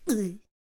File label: Blowdarter Death Vocals